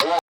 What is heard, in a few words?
vox